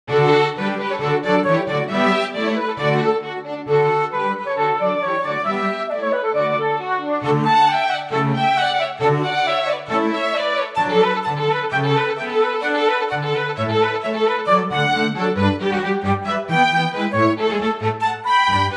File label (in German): Orchester